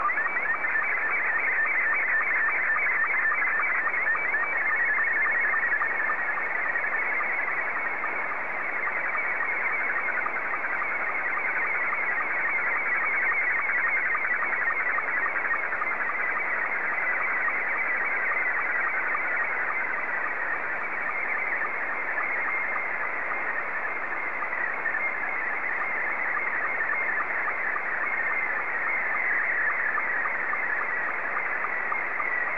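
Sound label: SSTV, Scottie 2; JA3QJQ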